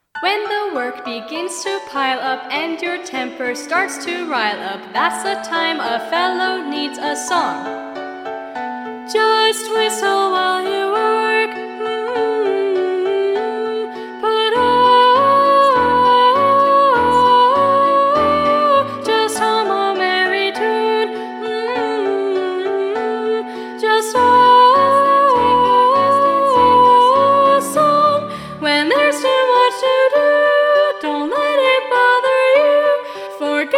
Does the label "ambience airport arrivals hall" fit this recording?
No